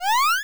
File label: show up, square waveform; Square Waveform sfx